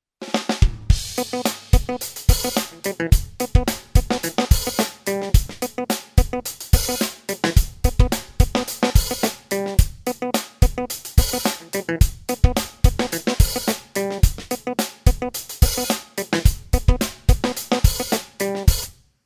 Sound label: Clavi